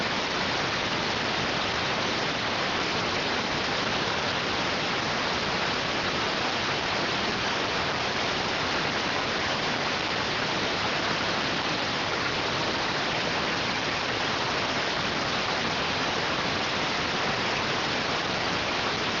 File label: rtty rx